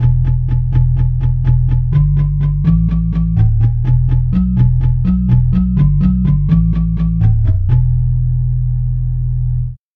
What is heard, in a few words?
percussive organ bass